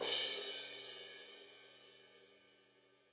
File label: Crash, cymbal; apulSoft apTrigga2; Multi-Velocity Cymbal Samples